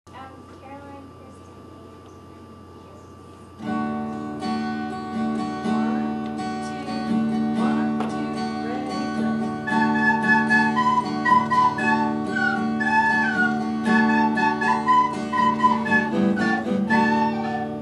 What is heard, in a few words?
Kookaburra Ostinato